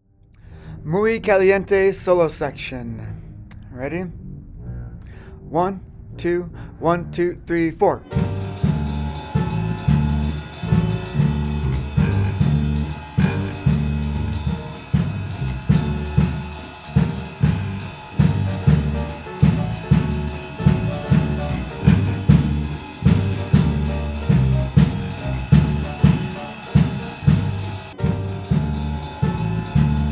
solo section

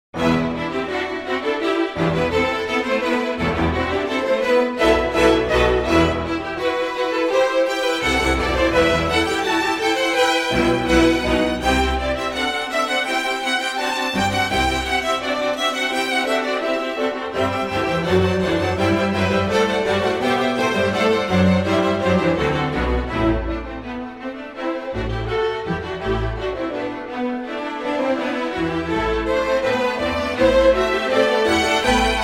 wood splitter fanfare

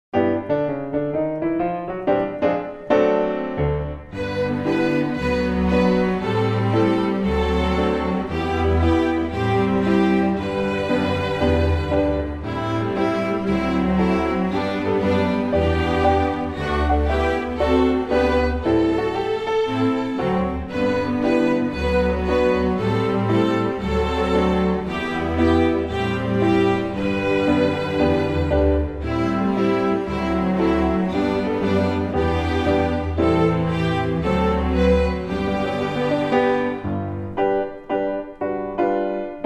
jazzy old saint nick string orchestra

